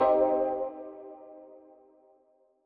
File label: Hit